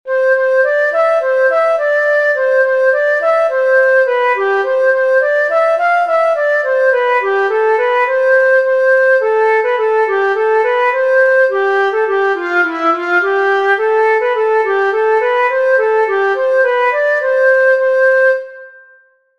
Yankee Doodle Key of C, flute solos

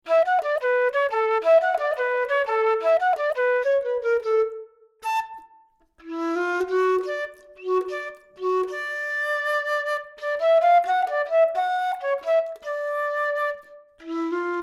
The Entertainer Flute Basic Edition

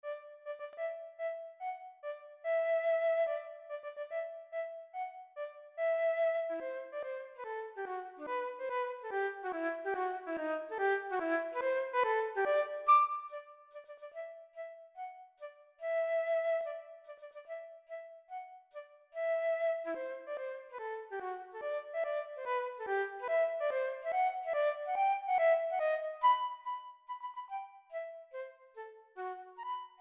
Flute; Tchaikovsky; March of the Toy Soldiers

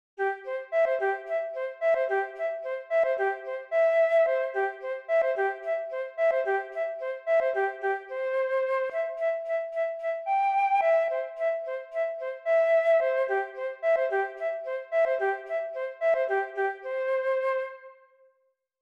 Key of C, flute; Reveille

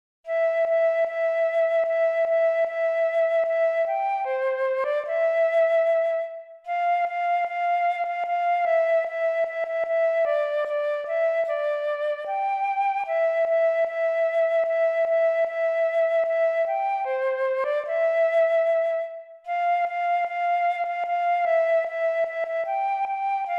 Key of C, flute; Jingle Bells

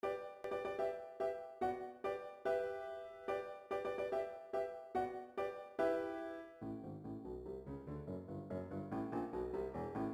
Flute and Piano backing; tchaikovsky; March of the Toy Soldiers

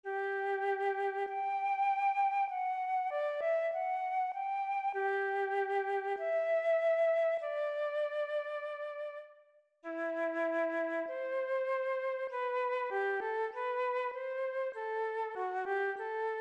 G, flute solos

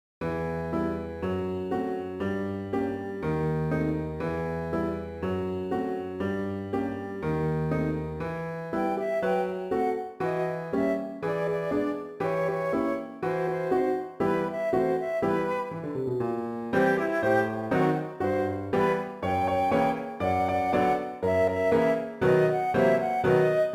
Flute & Piano; tchaikovsky; Dance of the Sugar Plum Fairies

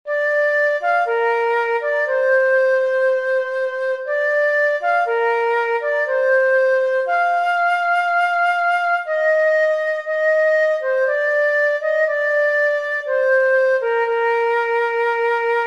flute; Air From Water Music

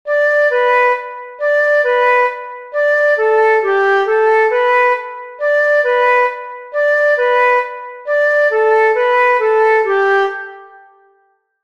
The Cuckoo, flute

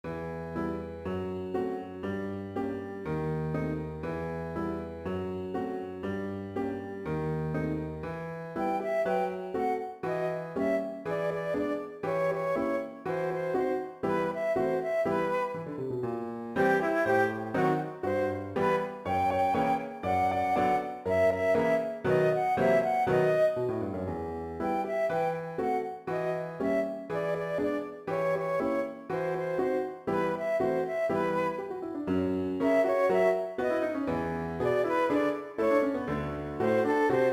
Dance of the Sugar Plum Fairies Flute & Piano; tchaikovsky; Complete Edition

